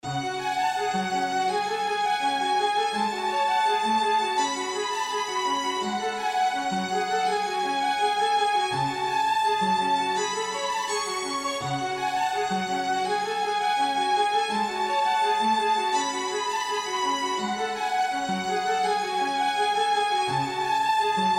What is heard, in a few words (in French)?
violons arpeges